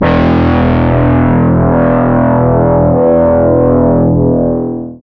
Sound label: GUI CR E, E DISTORTION, Metal Mix; Sound & Vision; Gigapack I CD 2